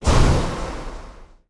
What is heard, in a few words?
Thorn Counter